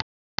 IBM double click; K-12 Interface Study

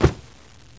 thud; Mr. Wonder's Greatest Toyshop on Earth; OmniMedia

